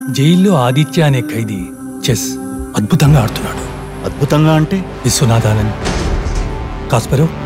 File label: Telugu Movie First Glimpse BGM Ringtone; Nithiin; Check